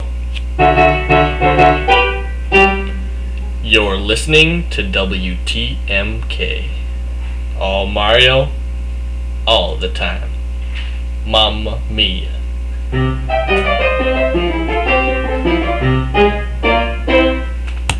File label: piano